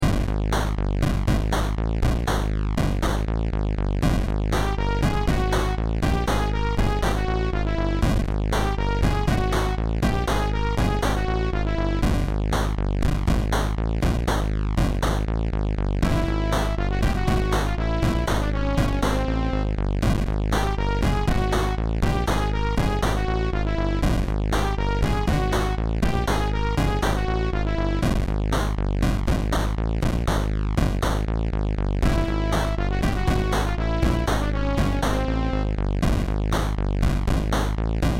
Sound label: A threatening 8-bit tune